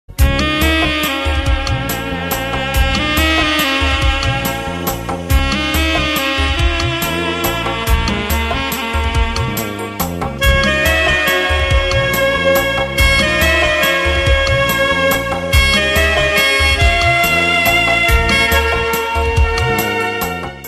Saxo

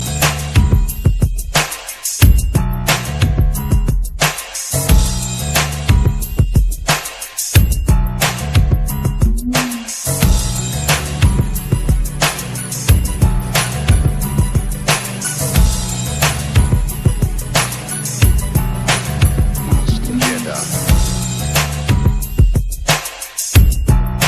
Hip Hop Beat

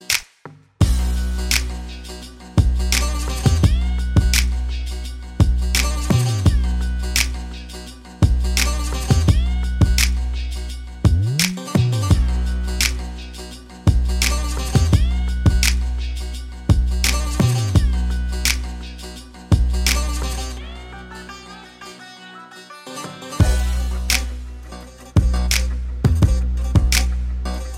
Turkish Trap; meloboom

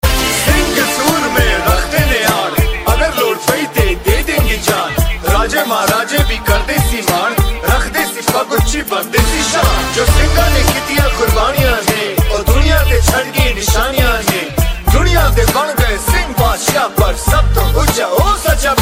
Panjabi

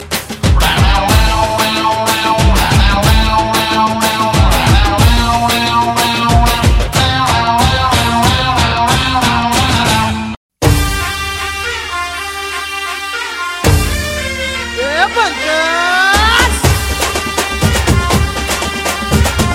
Music; Rowdy Rathore

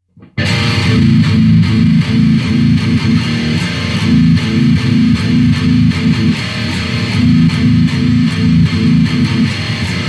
METAL

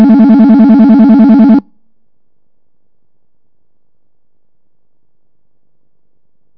Ring Slow High

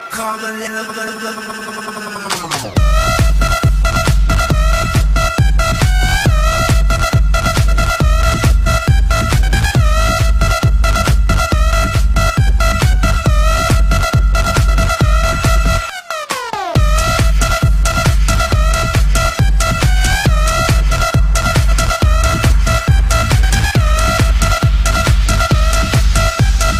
Dirty Electro

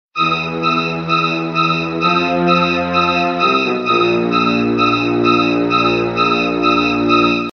Scary Ringtone

